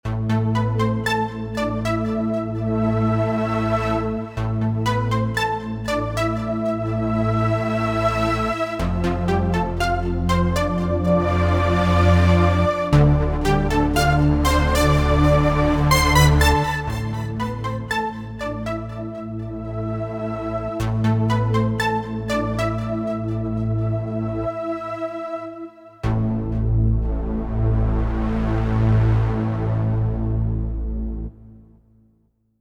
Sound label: saw; cms weihnachtskalender 2024 freeware